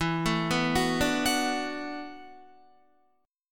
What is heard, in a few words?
E 7th Suspended 2nd Suspended 4th, down, Guitar; E7sus2sus4 arpeggiated; JGuitar